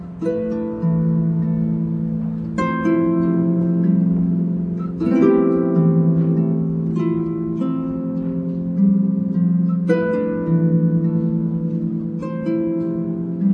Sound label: celtic harp; aisling; selkie